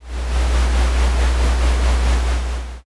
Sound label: escalator